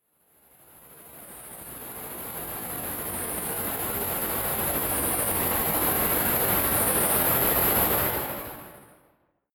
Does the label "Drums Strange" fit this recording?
No